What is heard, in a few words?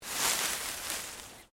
Leaves Move Medium; Material components for constructing more complex sounds; Rustling; Objects